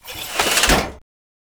whizz; weapons; fatman

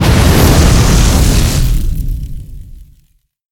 firey explosion

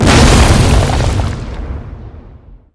impact ground heavy

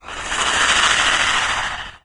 breath, imp; doom3

